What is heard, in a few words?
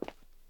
footstep; gmtk24